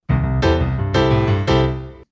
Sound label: Denver Airport Doors